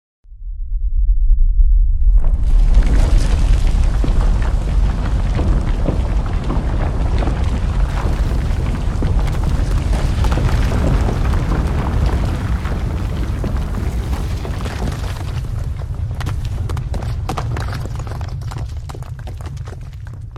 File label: Earthquake ground earth heavy large falling rock